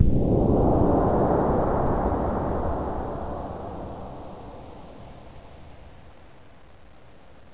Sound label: steel